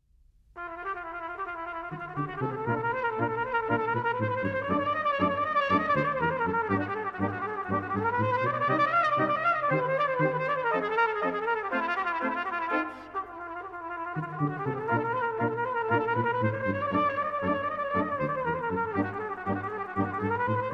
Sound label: Brass Ensemble